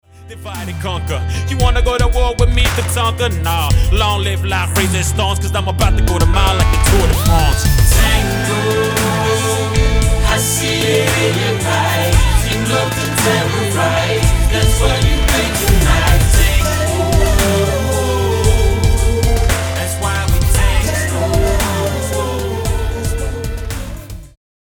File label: Tango